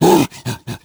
king conga attack; Crypt of the NecroDancer

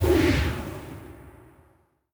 spell wind; Crypt of the NecroDancer

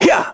melee; Crypt of the NecroDancer

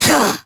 melee; Crypt of the NecroDancer

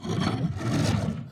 worm breathe, creatures; Factorio

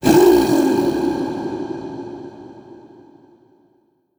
king conga death; Crypt of the NecroDancer

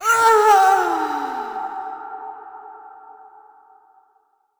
death; Crypt of the NecroDancer

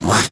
teleport; Crypt of the NecroDancer

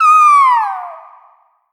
blues queen death; Crypt of the NecroDancer

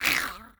zombie death; Crypt of the NecroDancer

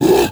kingconga hit; Crypt of the NecroDancer

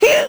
squish; Crypt of the NecroDancer

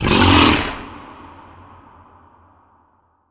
luteboss hit; Crypt of the NecroDancer

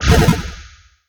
spell shield; Crypt of the NecroDancer

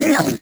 vo hurt; Crypt of the NecroDancer

